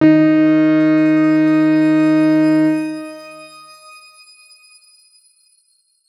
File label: X Grain D#3 mf; healing soundscapes; Ableton